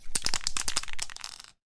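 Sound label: ui button enter spray booth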